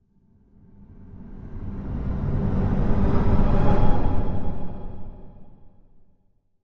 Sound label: Music Eerie Tonal